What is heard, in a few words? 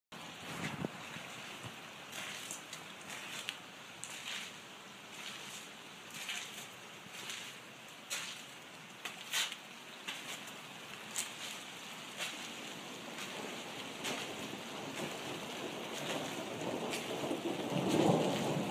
Running in rain